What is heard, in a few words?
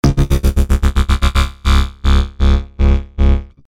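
Htc Bass Dubbed Music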